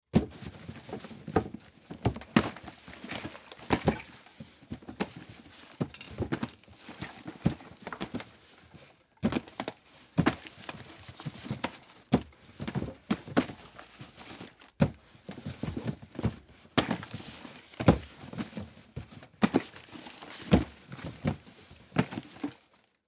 Boxes Stack, Boxes Stack Cardboard Stock Inventory; Boxes Stack Cardboard Stock Inventory Grocery Store; Factory; Industry; Factories